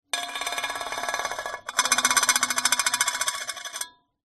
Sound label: foley metal serrated metal edge scrape on metal pan